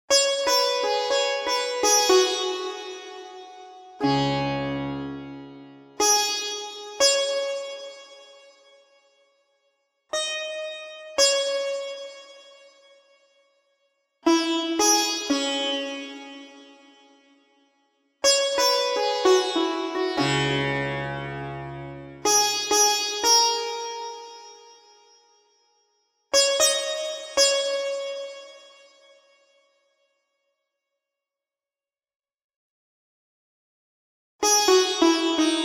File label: sitar